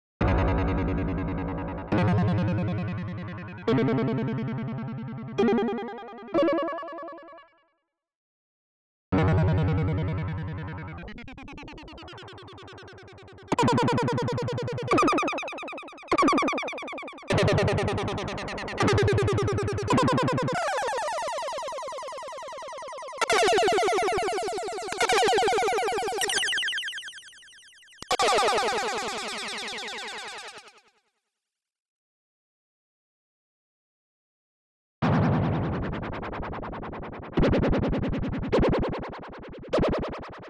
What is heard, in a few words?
square delay